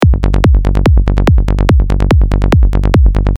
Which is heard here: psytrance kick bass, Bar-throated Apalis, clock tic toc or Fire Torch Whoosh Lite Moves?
psytrance kick bass